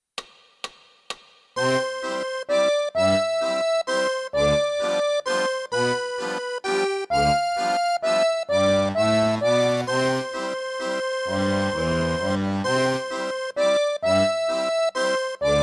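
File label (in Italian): Racconto alla Nonna, Fisarmonica 1 voce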